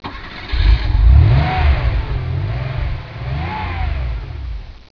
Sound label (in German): anlass